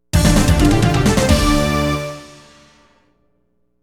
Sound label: Level Up; Leaf Early Collection